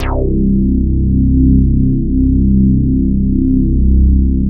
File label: MKS BASS